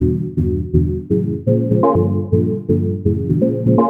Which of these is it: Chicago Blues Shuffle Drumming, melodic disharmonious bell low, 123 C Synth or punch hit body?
123 C Synth